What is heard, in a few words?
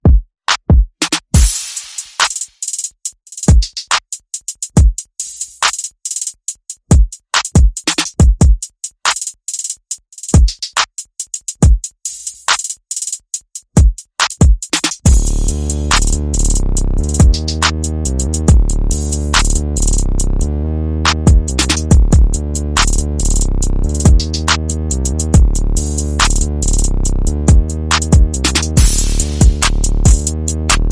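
bass and percussion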